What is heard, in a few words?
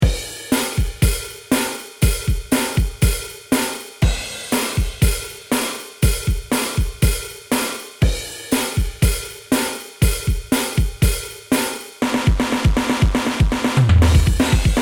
Yamaha FGDP 30 Set